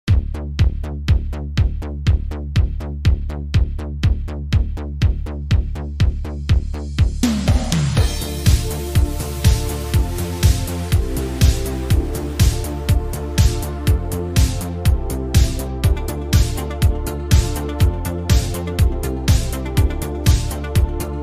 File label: 80s remix Italo disco